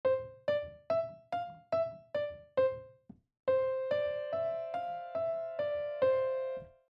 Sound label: piano sustin